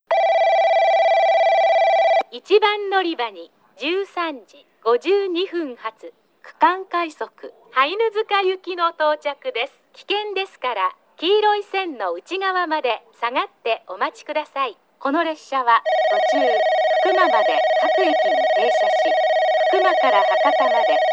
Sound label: regional rapid Hainuzuka; tobata